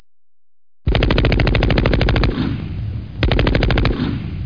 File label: gunfire